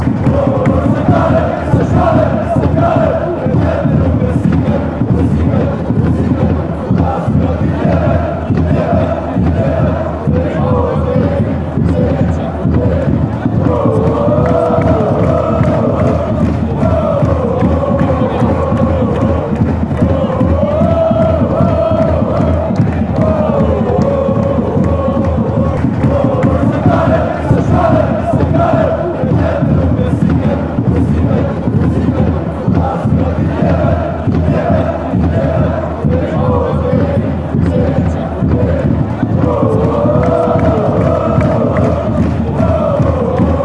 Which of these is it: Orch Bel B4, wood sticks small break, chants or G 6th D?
chants